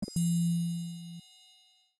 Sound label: Triangle Tap, notifications; Samsung Galaxy Z Fold 7; Sound Theme; Calm